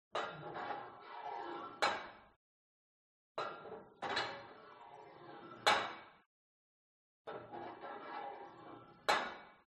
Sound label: SPORT GYM Put Weight Onto Barbell